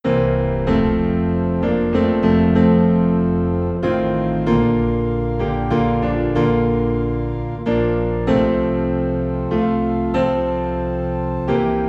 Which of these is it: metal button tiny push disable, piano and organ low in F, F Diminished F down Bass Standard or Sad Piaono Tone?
piano and organ low in F